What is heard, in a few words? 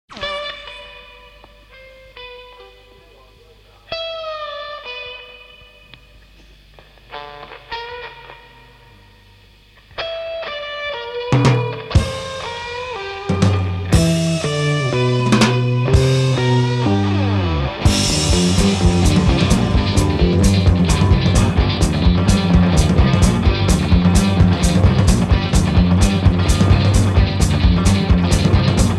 instrumental version